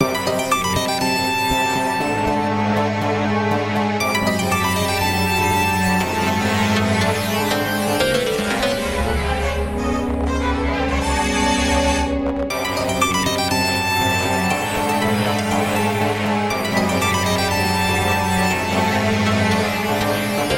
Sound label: symphonies of electronic instruments